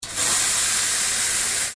Cafe

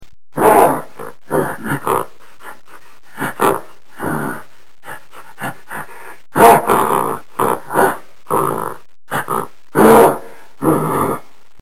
Gorille

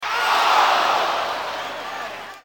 Foule

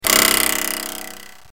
Ressort

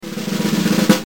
Tambour

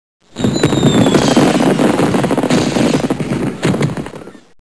Artifice

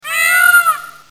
Paon

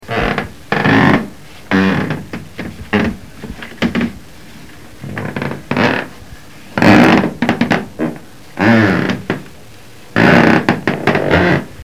Craquement

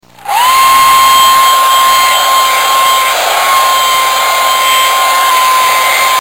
Seche cheveux

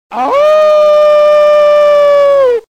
Loup

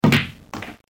Billard

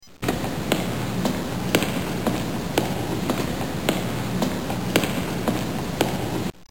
Marche

